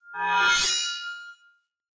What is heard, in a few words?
Player Short Sword Whoosh Heavy Accent; Weapons; Swords; Accents